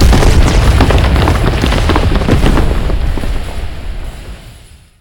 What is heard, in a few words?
impact; planetoid